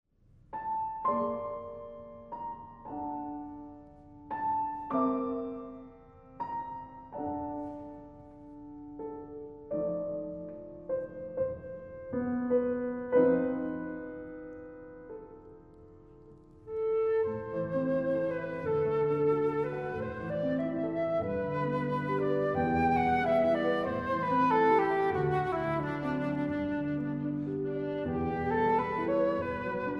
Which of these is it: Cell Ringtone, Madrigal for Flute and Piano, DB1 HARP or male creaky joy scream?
Madrigal for Flute and Piano